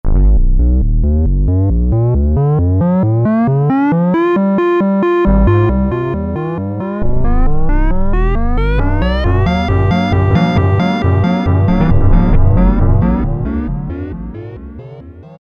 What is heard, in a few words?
Catastrophe; Roland U-220; Factory Timbres